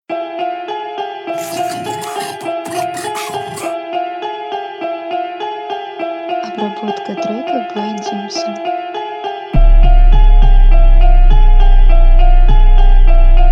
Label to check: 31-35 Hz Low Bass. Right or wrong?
Right